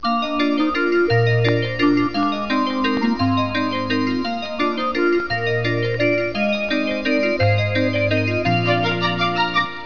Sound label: WALTZ